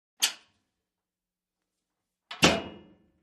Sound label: Wash Mach Lid Open Clo; washing machine